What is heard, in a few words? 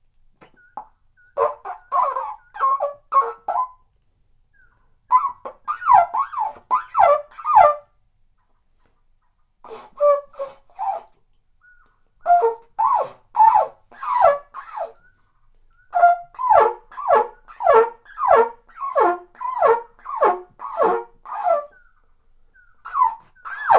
bird